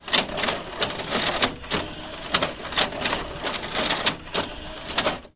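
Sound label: CD player sounds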